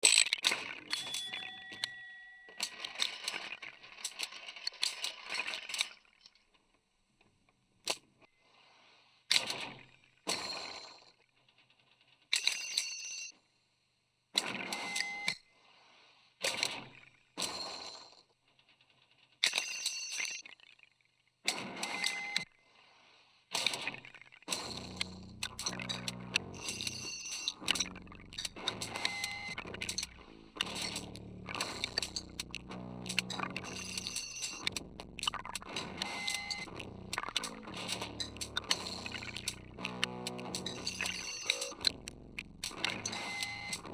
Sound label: rhythm